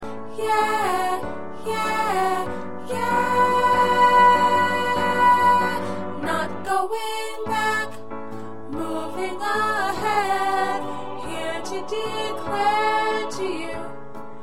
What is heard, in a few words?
Alto, choir parts